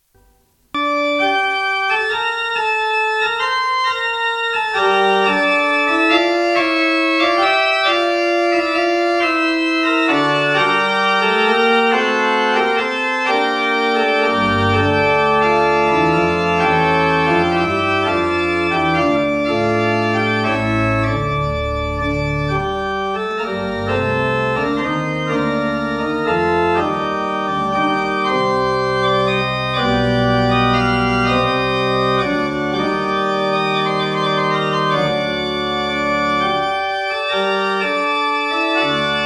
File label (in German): Klangbeispiel, Orgel; kirche